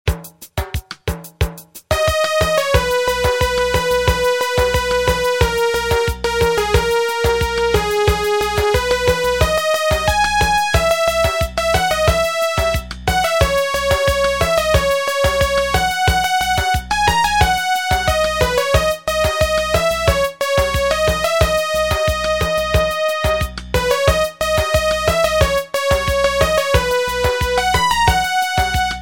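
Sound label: instrumental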